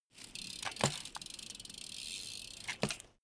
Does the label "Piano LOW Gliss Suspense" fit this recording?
No